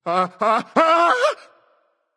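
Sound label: non verbal fear, voice; cernunnos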